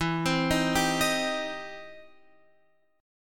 E Minor Major 7th Sharp 5th, E, down, Guitar, Open F; EmM7#5 arpeggiated